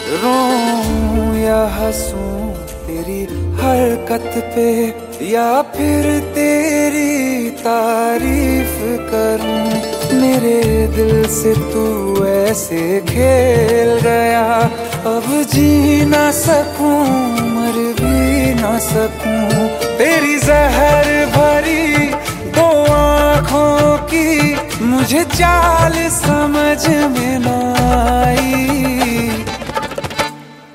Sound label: Sad ringtone